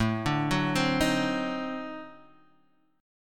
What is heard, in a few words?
D 7th Flat 9th A, chord